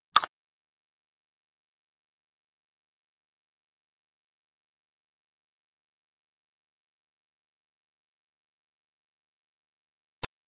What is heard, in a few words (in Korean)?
Fights, 싸움-전투; 헐리웃특수효과음